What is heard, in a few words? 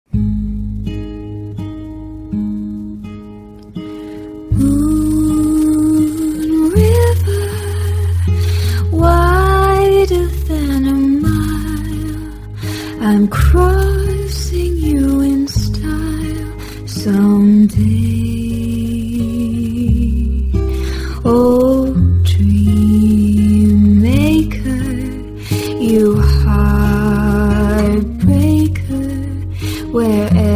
acustico; audrey hepburn